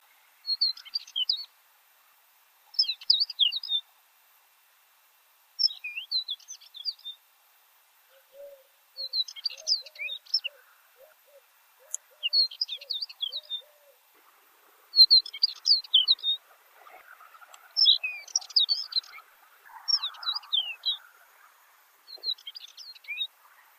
suara kicauan warbler kacamata

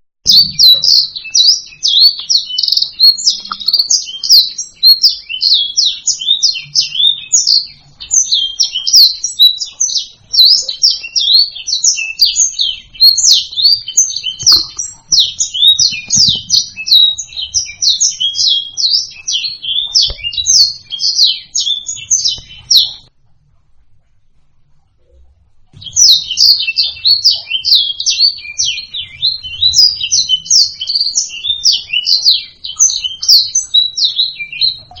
suara kicauan burung blacksang

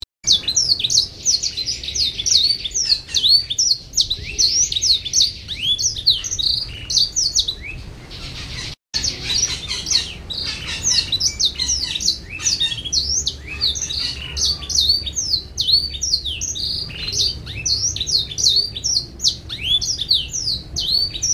kenari rasa blackthroat

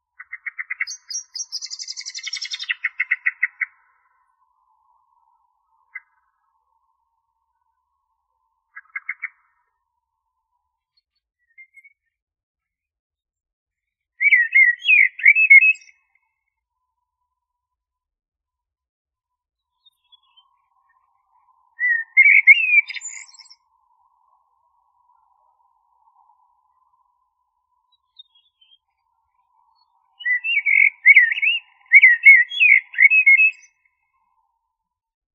suara masteran blackbird

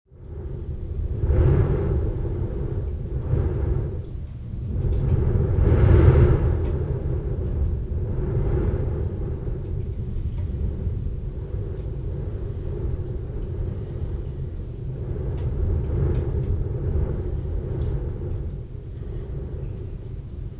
hujan dan gemuruh guntur

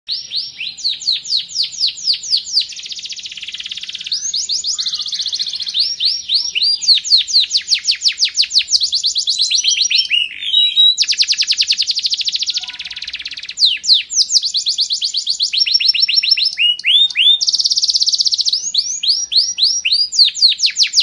audio kenari; sekar jagad

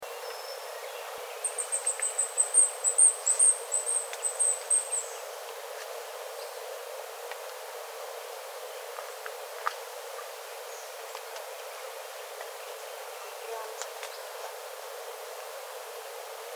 suara burung cabai emas

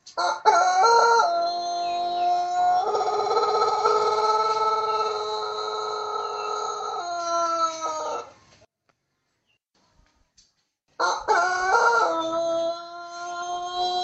ayam pelung

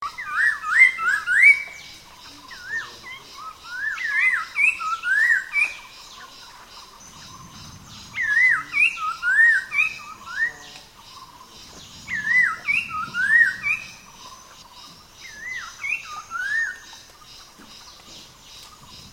poksai genting

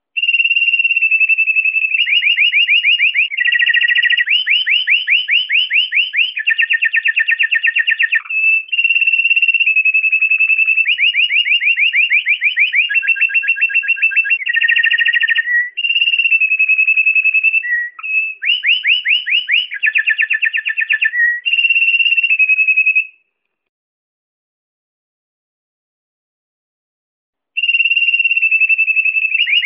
suara masteran spesial pleci

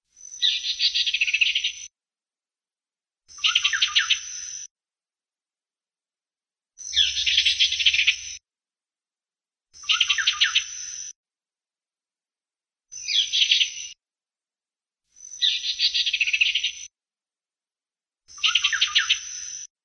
suara panggilan burung ciungair coreng kalimantan

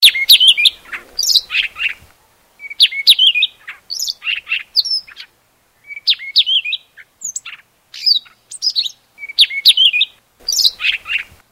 suara siberian rubythroat

